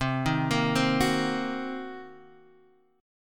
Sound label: C Minor 7th Flat 5th, Guitar, Standard; Cm7b5 arpeggiated; JGuitar